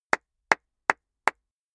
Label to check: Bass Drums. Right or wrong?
Wrong